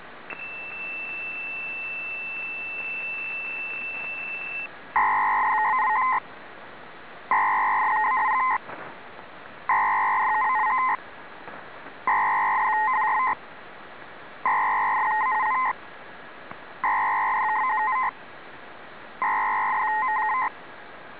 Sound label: E-ALE, SPANISH NAVY ALE